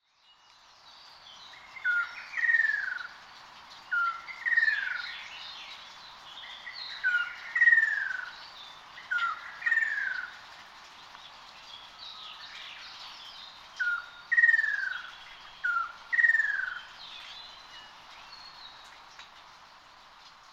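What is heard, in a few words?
akashobin, Ruddy Kingfisher; koe; osado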